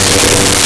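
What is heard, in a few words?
Synth Bass 1 G3; Nintendo; July 2020 Gigaleak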